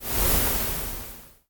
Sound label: water splash